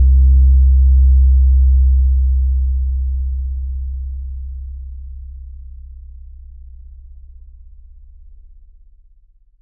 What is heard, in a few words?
Drone